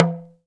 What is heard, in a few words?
TALK DRM, Heart of Africa; Spectrasonics